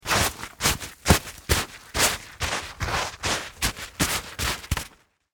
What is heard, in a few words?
Weather Snow Movement Dig With Hands